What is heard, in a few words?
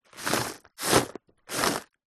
wasabi peas plastic pack open movement x3